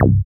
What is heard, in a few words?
BASS MONO